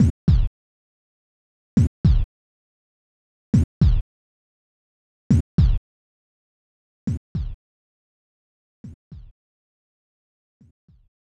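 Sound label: Heartbeat, game music; lennus 2; snes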